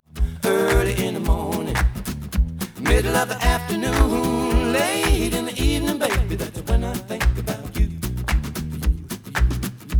noised; 4bit

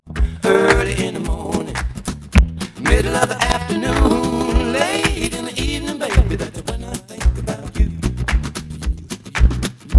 noised; 4bit

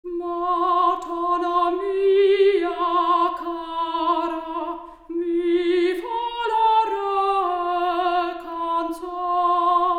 Soprano Stereo

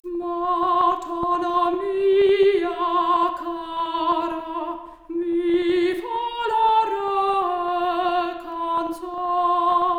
Soprano Stereo output noised; 3bit

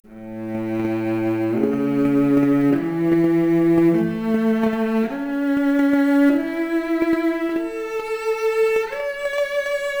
Violoncello arp, mp Stereo output noised, 3bit

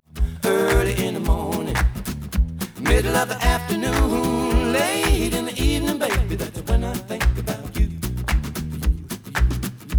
Stereo output noised; 3bit

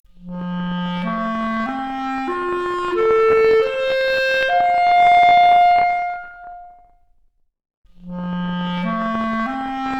Clarinet arp, Stereo output noised; 4bit

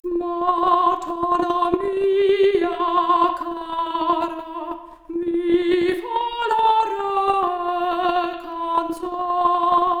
Soprano Stereo output noised, 3bit

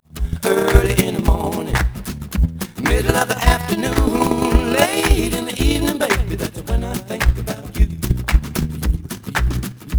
noised; 3bit

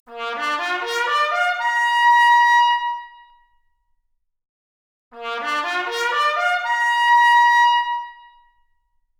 Trumpet arp, Stereo output noised; 3bit

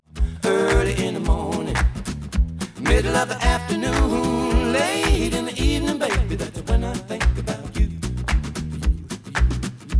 Stereo output noised; 4bit

